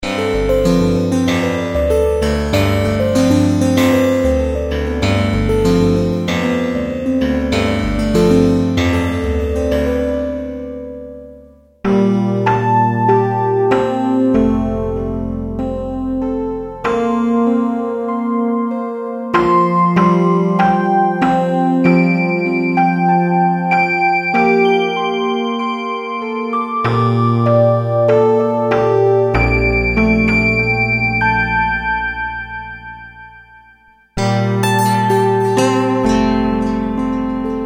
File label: Kawai K1 acoustic